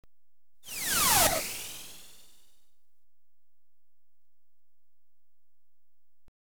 Missile Ricochets Right To Left, Electronically Generated Sounds; BBC Sound Effects Library